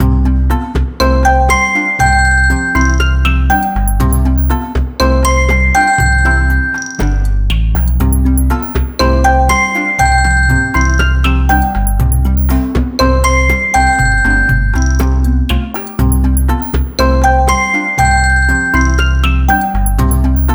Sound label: Ringtone; Unihertz; Jelly Star